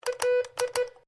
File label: Morse code L; Morse code aplphabete; Electronics